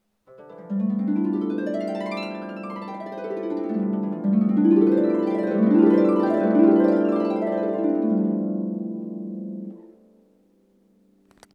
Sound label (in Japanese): スケール